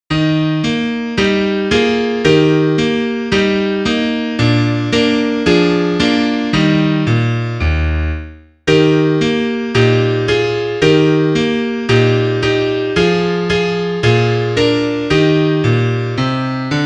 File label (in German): Klavier; haensel und gretel